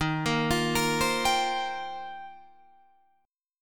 D sharp 6th Add 9th, D sharp, down, Guitar, Drop D